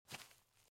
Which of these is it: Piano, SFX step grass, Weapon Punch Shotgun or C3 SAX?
SFX step grass